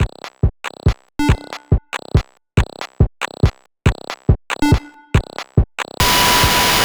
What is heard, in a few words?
Drums, 140 BPM; CHIPSHOP DELUXE; CHIPSHOP LOOPS